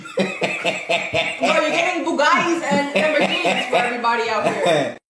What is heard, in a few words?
laugh